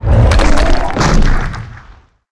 golem dead, monster

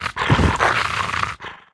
fox dead, monster

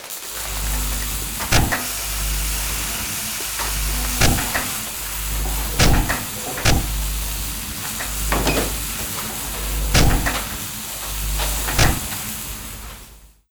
boss main, monster

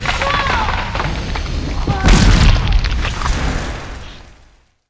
seedqueen death; monster

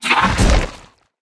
mos queen dead, monster

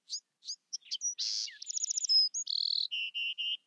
bird, jungle

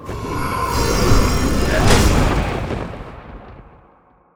reaperboss, monster

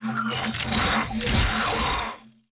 Mech spwn, monster; steamer crazy

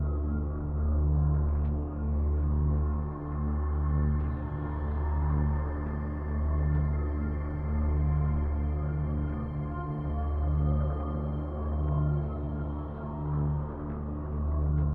warp

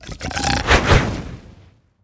zombie attack, monster

